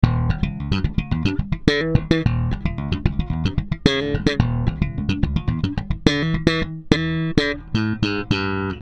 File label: Pultec Bass Gtr